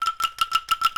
E5 ANKLUNG, JAVA ANKLUNG; WorldSounds

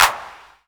CLAPS HIP; Best Service Dance Mega Drums

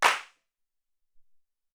Stereo Clap

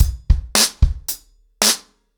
110BPM, ACOUSTIC DRUMS; The Roots Drumloops

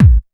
kick dance, techno trance drums; Techno Trance Essentials

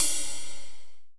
HH, HIHAT HIP, Dance Mega Drums; Best Service

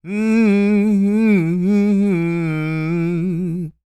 SING, Blues Soul; Spectrasonics; Vocal Planet

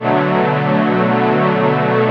PADDY B m7; E-MU Formula 4000 Series Vol. 2; Orbit Presets

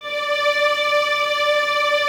violins D 5, String Ensembles, 23 Violins; Miroslav Vitous

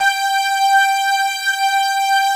WS PWM G4

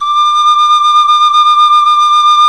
SIKU VIB D4; The Andes

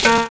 KLAXON BE; Univers Sons - Kitch 70s

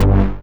Synth Hit G

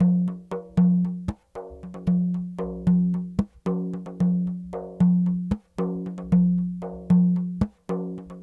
Sm Frame, World Percussion

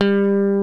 LES PAUL

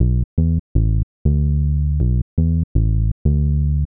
Smooth Bass (120BPM), retro house

